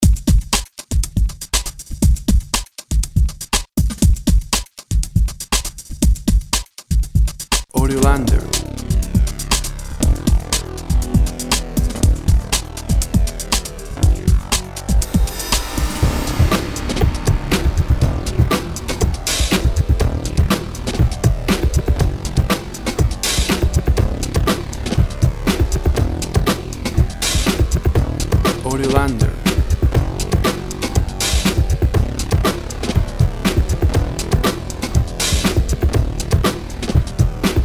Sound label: section zero